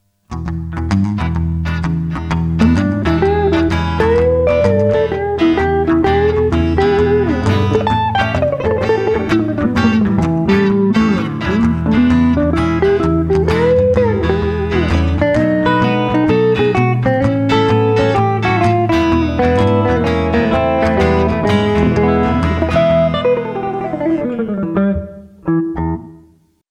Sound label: trio rock n roll